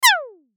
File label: LASER DESCEND; Multimedia; Lasers; Blastwave